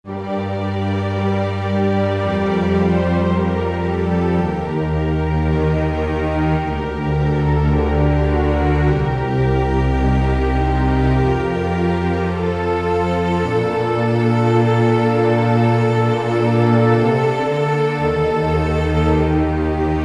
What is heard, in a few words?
Full Orchestra, Roland SR-JV80-02 Orchestral